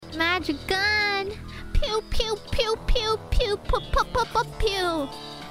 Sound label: magic gun pew pew